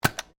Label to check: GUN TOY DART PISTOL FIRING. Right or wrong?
Right